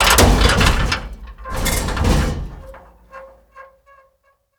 bridge down; Modern Warfare

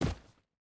footstep hide stones jump land; Rust

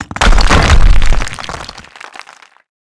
fence stone mid; World of Tanks Blitz

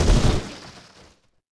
Catapult Hit; Civ4 warlords

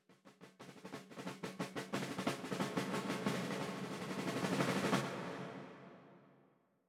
music interactive; World of Tanks

